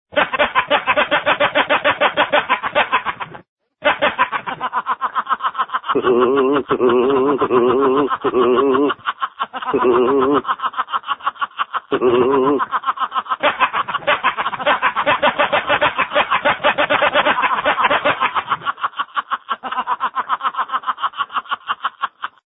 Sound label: Laugh Montage